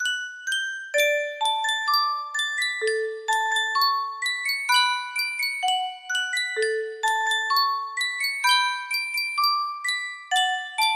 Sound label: sankyo music box, music box melody